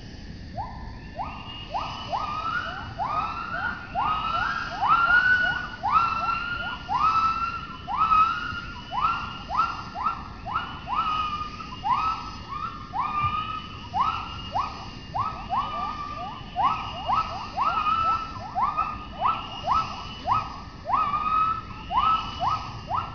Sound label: Scream song